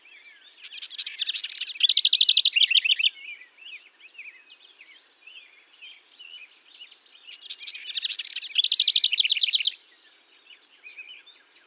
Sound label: house wren